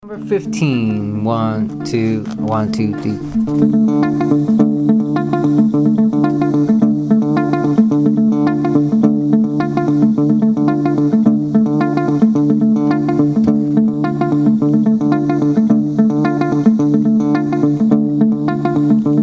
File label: mozambique patterns